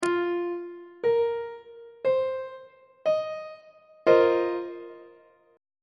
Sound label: e sharp dominant 7th suspended 4th chord on treble clef